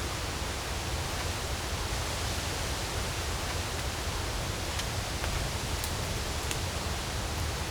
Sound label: wind cracks and snaps, ambient